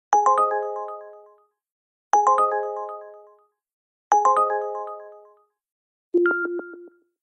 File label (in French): sonnerie course; uber eats 2020